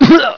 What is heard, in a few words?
pain